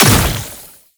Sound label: Brood Projectile Impact; Warframe; Oraxia